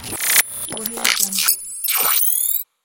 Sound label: hud menu